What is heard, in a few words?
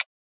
Rim